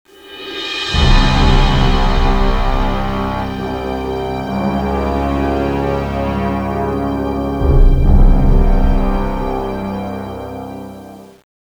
Jingle Lost; emergency disaster rescue squad 2008; nds gamerip

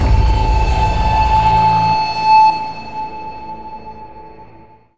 Overlay Combat; battlefield hardline; ps3 ps4 windows xbox 360 xbox one

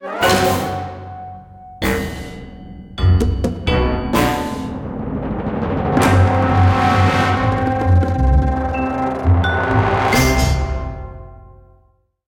door; Sam & Max Save the World Remastered; oval office; door War Room

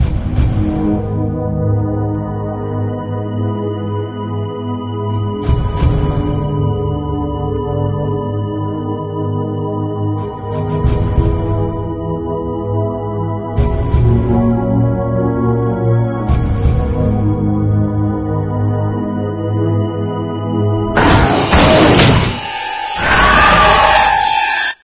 Wounded Music; Doom 3

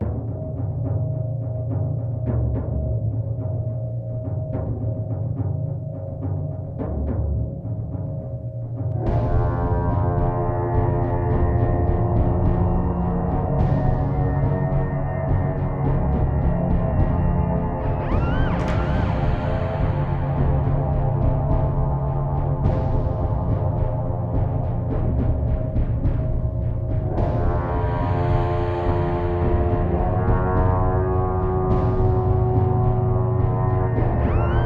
creepy ambience combat; Vampire The Masquerade Bloodlines

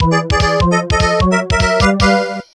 Hurry Fanfare

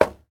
Metal soft

